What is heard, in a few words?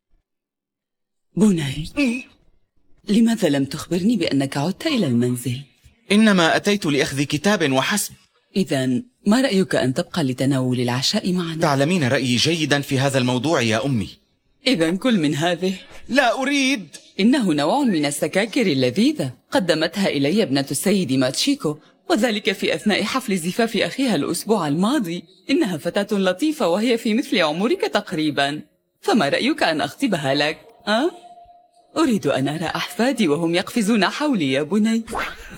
vocals